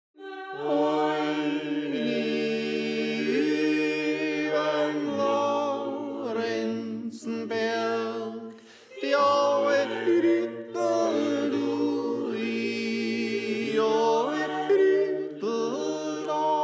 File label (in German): Tenor; 63-Geh Ma Oine Übern Lorenznberg